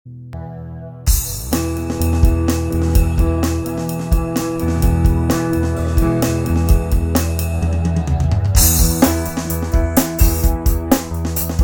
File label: key of e